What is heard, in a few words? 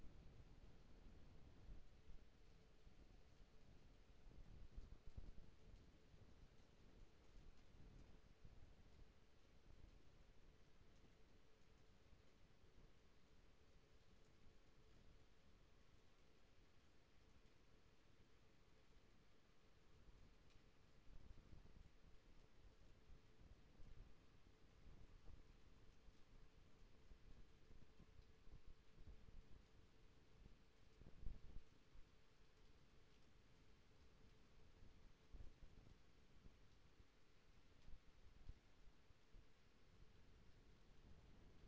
HYDROPHONE 16000Hz; JASCO AMAR HYDROPHONE 740